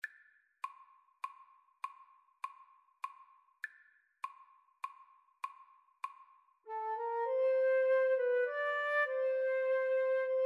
rugged cross, Flute-Clarinet Duet

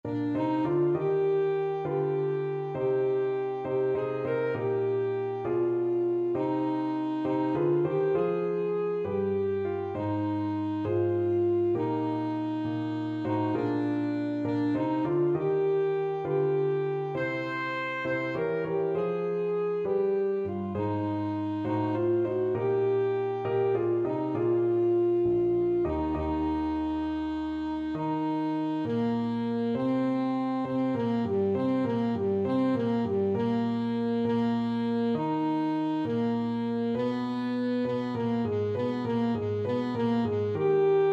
saxophone; lift every voice

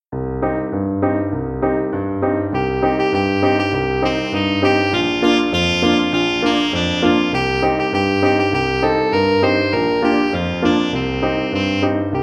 saxophone

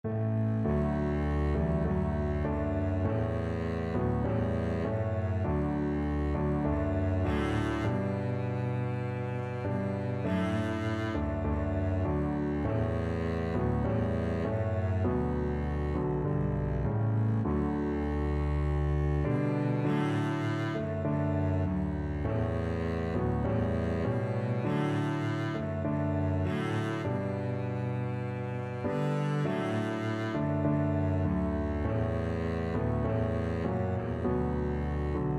auld lang syne, Double Bass

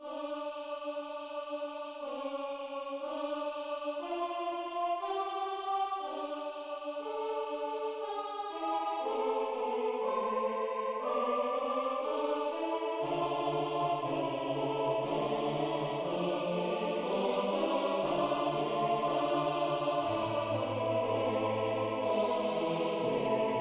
recorder

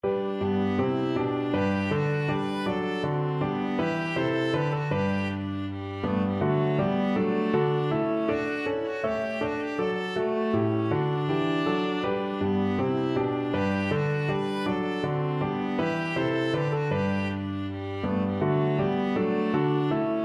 viola

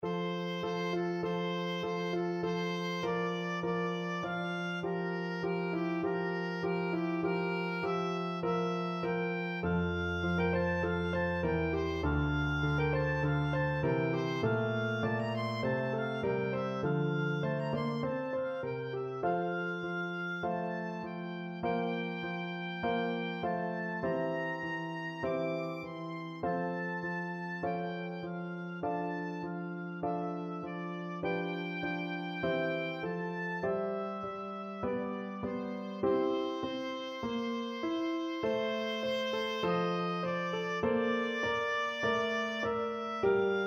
bach sheep, oboe

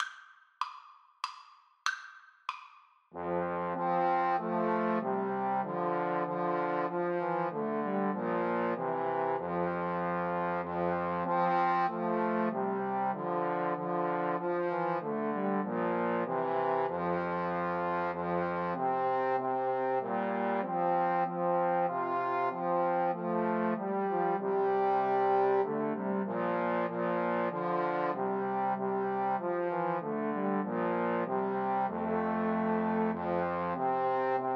Christmas Hymn trombone trio

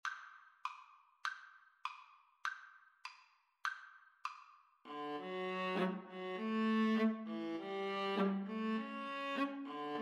viola viola

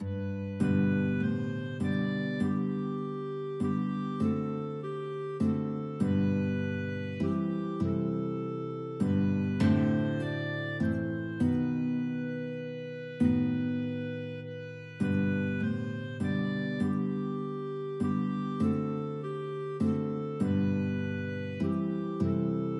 night dark guitar clarinet, guitar clarinet duet

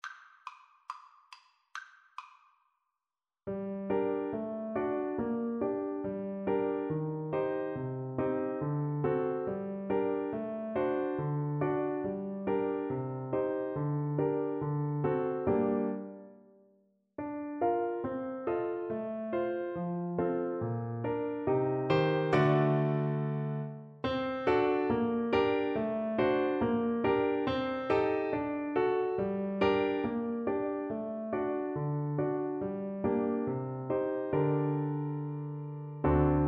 tenor horn

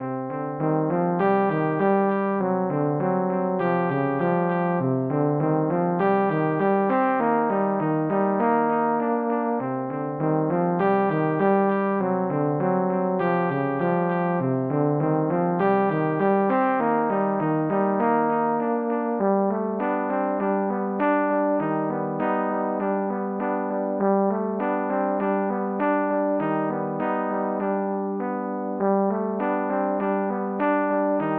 grieg mountain king, 2 Euphoniums Tuba

